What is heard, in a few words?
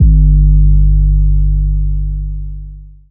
808 clean G; Hip-Hop; Tonal; Slate; CAICOS Hip-Hop Sample Pack